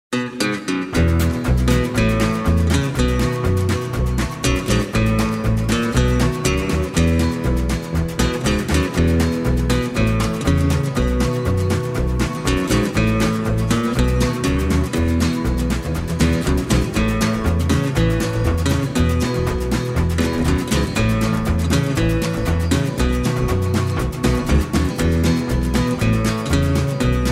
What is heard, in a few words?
Acoustic, Surf Music; rockabilly; Hot Rod